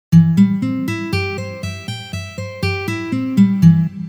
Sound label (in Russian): Arpedzhio na gitare Do mazhor